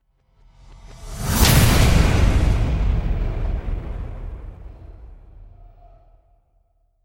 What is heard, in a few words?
destruction slams explosion with whoosh